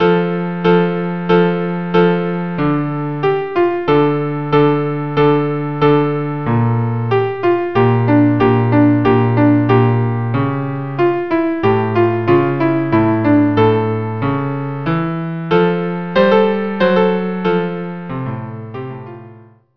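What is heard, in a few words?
viola cello; Hebrew Melody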